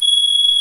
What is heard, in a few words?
LINEAR SYNTH